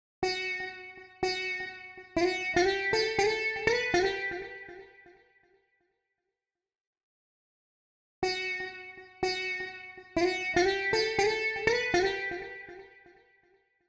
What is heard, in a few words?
Sitar